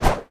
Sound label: Water Splasher; rabbids multiverse; iOS, macOS, Mobile